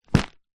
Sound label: household plastic pillow or duvet bag set down on carpet